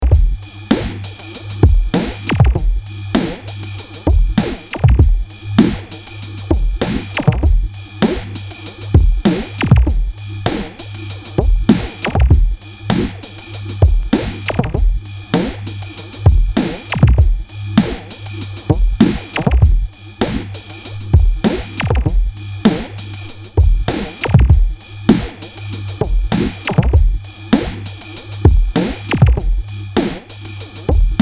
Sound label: short hip hop beat